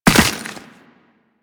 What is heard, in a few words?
Operator Land Soft Ice, Footsteps; Warframe